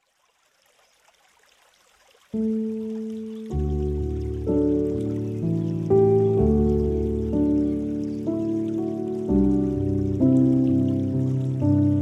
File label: Forest Sounds; مدیتیشن